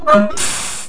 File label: Sound wheel